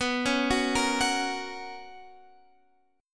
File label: B Major 7th Suspended 2nd, chord; arpeggiated